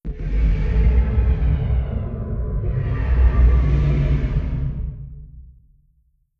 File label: distance stellarites idle breath; Stellaris; guardians